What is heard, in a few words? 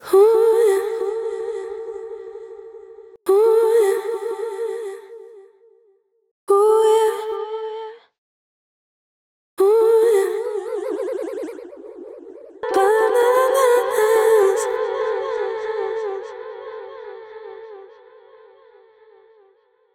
delay; overloud otd 2; Delay-Plugins